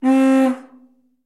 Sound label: 1947 Dodge Horn Honks; dodge pick up 1947